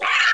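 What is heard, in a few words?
SCREECH; AB3DII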